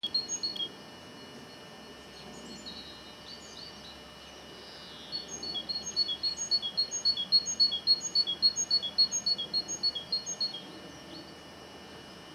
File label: Good Birds; Aylesbury trip